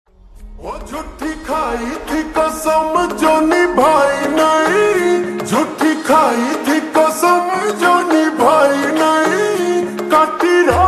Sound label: Slowed Reverb Ringtone; stree 2